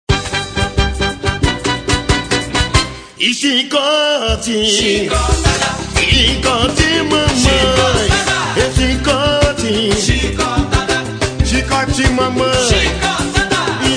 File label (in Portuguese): pagode